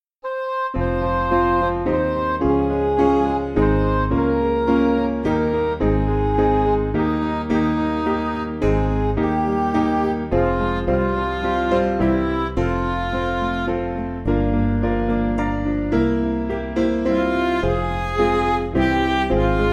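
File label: Music Backing Files for Churches; O Trinity Of Blessed; Hilariter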